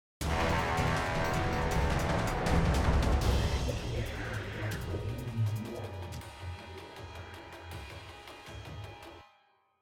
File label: Boss Combat Death; Shax